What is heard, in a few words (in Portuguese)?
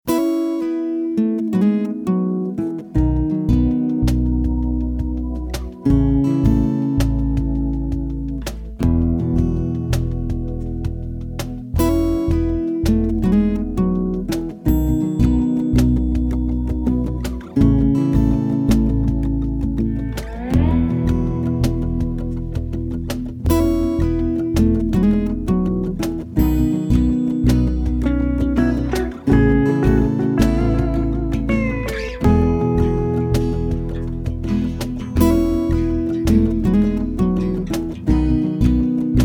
caminhada instrumental